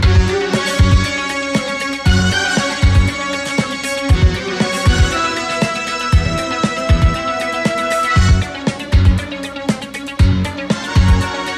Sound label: Main Game Music; knight rider